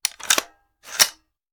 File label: Machine Gun Foley; Sound Ideas; Guns